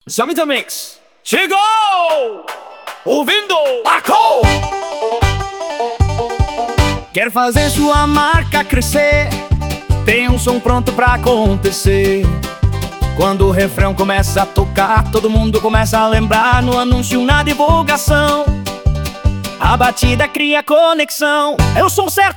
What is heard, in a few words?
USA Bluegrass Banjo